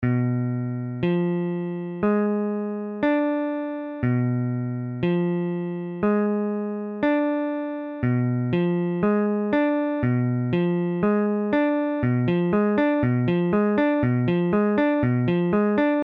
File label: Bdim7, guitare